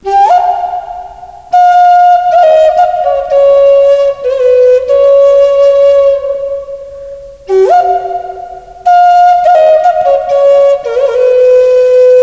iroquois flute; Indian in the Cupboard

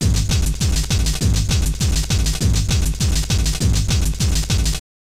Filler; Bomberman64

